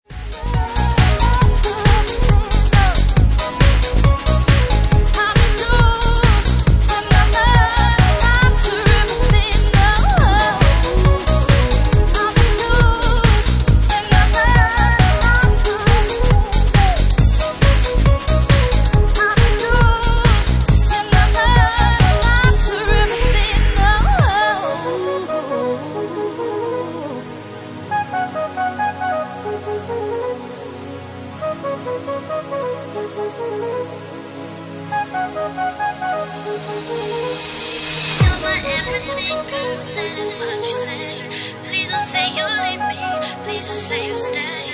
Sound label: Organ Remix